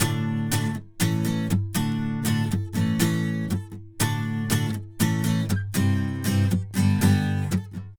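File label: Classic Rock Acoustic, Guitars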